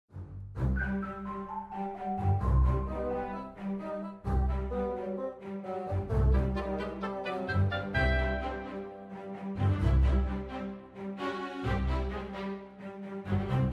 BGM

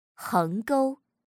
stroke

